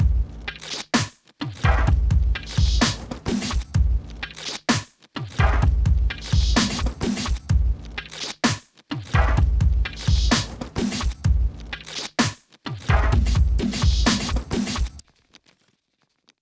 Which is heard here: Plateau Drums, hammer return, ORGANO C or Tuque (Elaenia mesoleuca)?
Plateau Drums